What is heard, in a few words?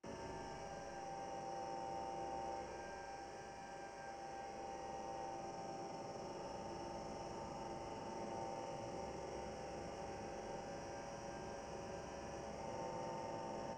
Cp loop, Train; OpenBVE